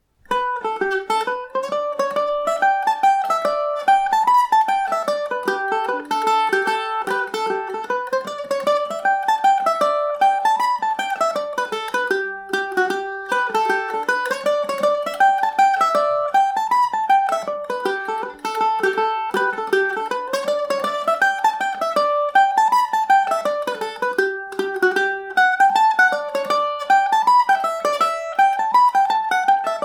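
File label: G major, irish mandolin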